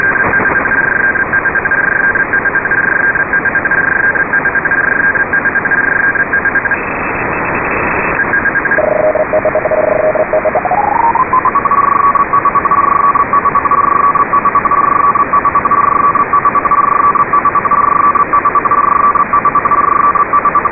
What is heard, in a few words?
beacon narrow; MERIDIAN 6; 7600 MHz